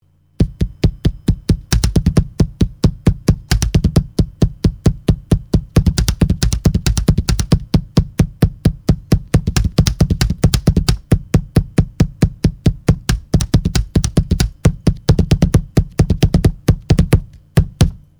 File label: drum keyboard